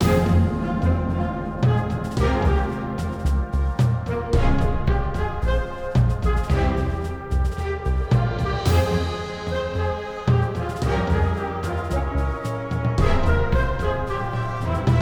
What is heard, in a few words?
Slime Battle